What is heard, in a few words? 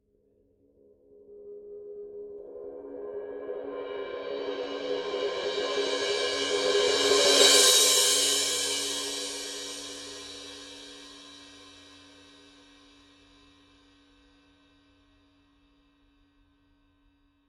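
signature 17 power crash roll, cymbales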